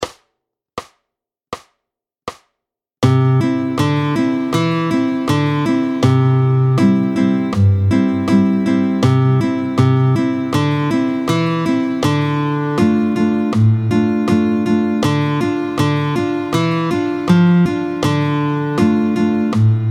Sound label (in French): Stewball 80, picking